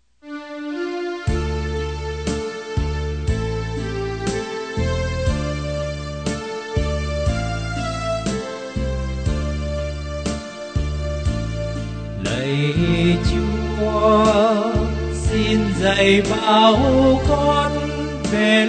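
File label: soprano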